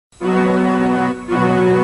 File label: Pipe; Loch Ness